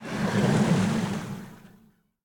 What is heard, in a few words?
glide; smite; poseidon